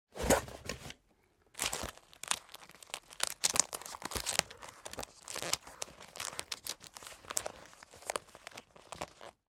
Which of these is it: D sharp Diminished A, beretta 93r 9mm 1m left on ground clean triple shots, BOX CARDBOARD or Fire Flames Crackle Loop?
BOX CARDBOARD